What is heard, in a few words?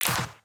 Sci-fi Pulse Gun Blast